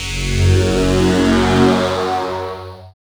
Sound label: SYN DANCE; Sound & Vision - Gigapack II